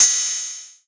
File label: Ride, Techno Drum One-Shots